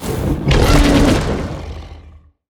shoot fireball; crocs; abominor

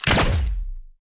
tower rocket Gunners phosphoric Coating

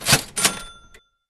cash

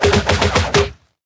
heroes spin; torres demolition Man

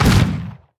heroes broden basic attack impact

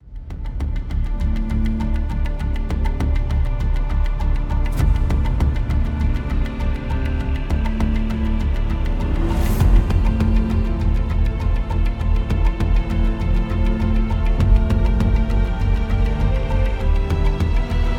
Battle; Rising Tides

